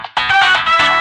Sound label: SMS; Portal 2